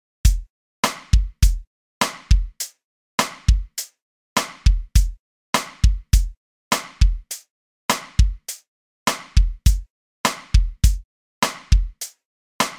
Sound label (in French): couplet drums